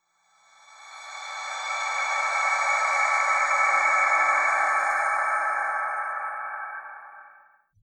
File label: Teleportation